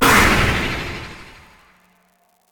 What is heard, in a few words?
magic spells impact air; sonniss gdc